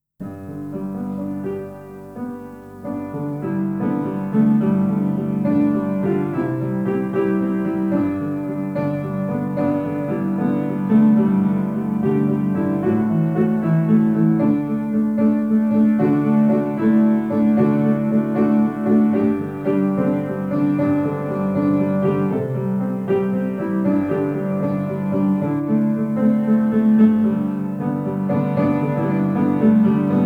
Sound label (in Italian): pianoforte solo musica; Cantici solo Pianoforte